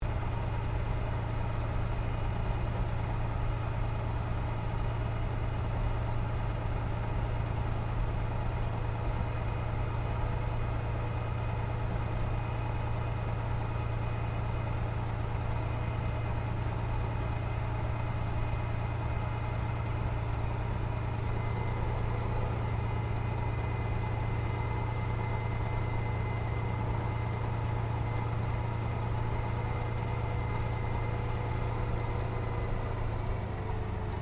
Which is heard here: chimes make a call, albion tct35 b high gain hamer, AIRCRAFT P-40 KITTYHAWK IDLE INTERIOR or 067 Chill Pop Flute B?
AIRCRAFT P-40 KITTYHAWK IDLE INTERIOR